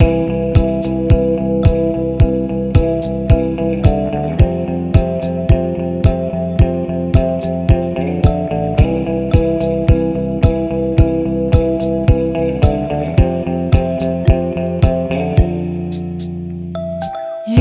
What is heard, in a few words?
109 Bpm